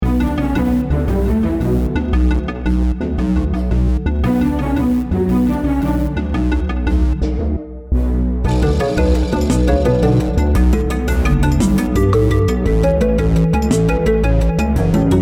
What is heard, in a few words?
war humans march with tail